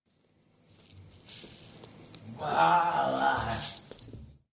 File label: Aah